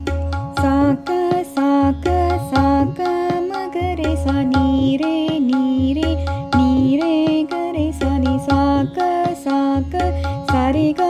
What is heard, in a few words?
Shudh Notes Melody